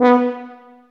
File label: TUBA, Solo Brass, Tuba stacc